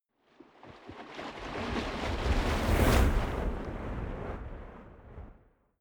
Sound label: waves surge soft